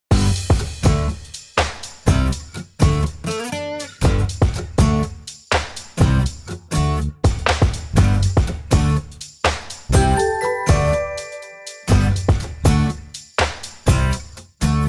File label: Music Tracks